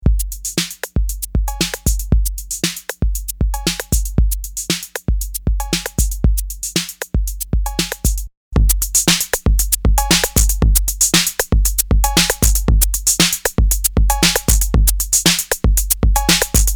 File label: 808 loop